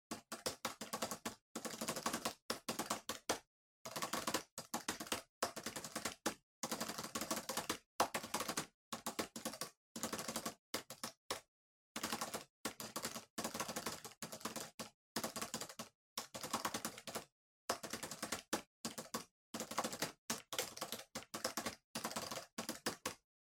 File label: PC Keyboard Far Hard